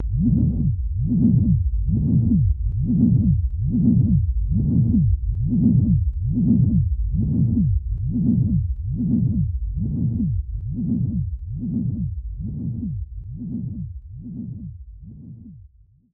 Sp Expansion ball Loop

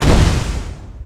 Bomb; disney star smash android ios mobile gamerip 2020

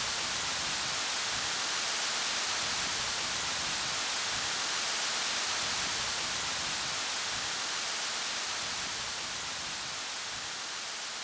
rain

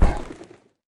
Giant Kiwi Die; Lethal Company

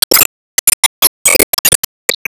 Enemy Factory Active Loop

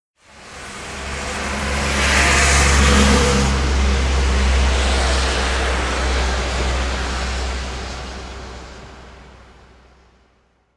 Hooverdam Truck high

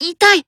Emo Pain; disney star smash android ios mobile gamerip 2020

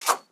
Swipe; Joblin; Going Under

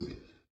Hurt; Black Hat

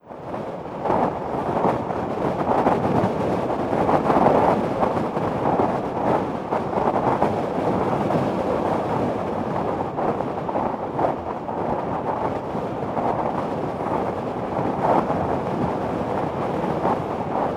Cities Wind

